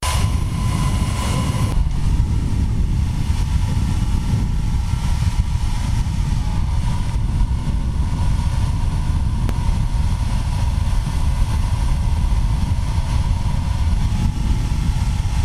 flugzeug

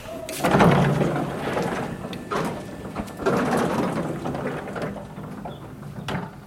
schiebetuer

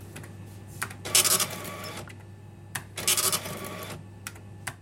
kasse

